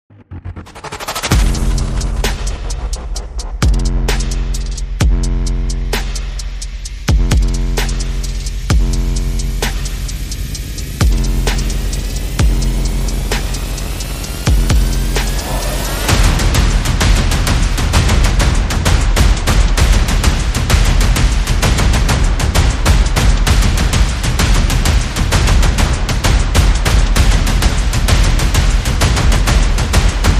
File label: Percussion Bass and Effects